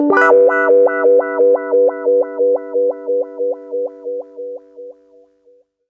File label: 089 WAH PAD, Electric Guitar Loops; USB Soundscan vol.04 - Electric & Acoustic Guitar Loops; AKAI